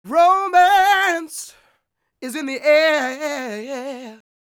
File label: SING W, Vocal Planet Blues & Soul, BLUESY; ILIO